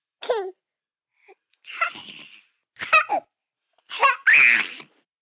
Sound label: Giggle Cooing, Laugh, 1 baby, 1 yr., gurgle, scream, delighted, human voice, child, kid; Laughter Sound Effects; infant, happy